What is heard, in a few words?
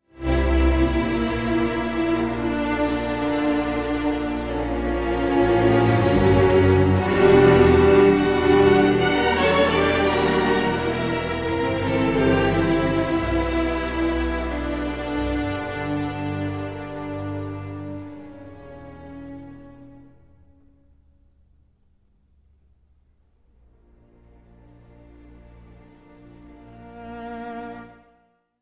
elegy for viola string quartet and string orchestra; howells